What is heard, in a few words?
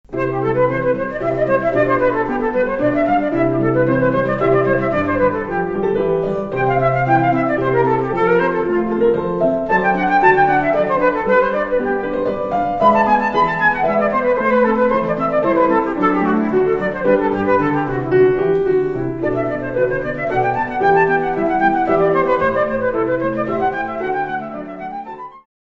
basso continuo